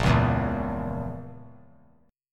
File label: A sharp Diminished, chord; strummed